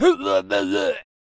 Death Vocals

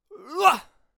Bomb on a Stick Attack Vocals

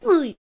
Blowdarter Death Vocals

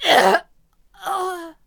Pirate Queen Death Vocals

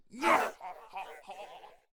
Dark Peasant Attack Vocals

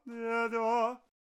Divine Arbiter Attack Vocals

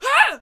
Attack Vocals; Sarissa

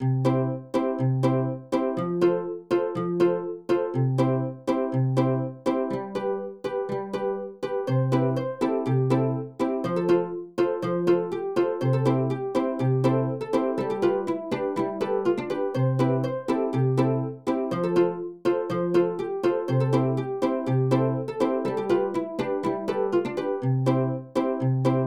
Banjo Music

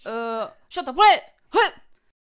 CLAMS Attack Vocals

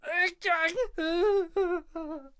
Ice Archer Death Vocals

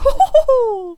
Fencer Attack Vocals

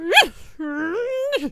Painter Attack Vocals

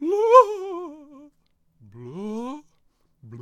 Healer Attack Vocals

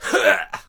Attack Vocals; Archer

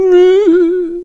Healer Attack Vocals

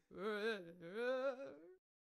Candlehead Attack Vocals

